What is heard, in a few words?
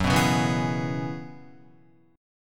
F sharp 9th Suspended 4th F sharp Guitar; strummed; JGuitar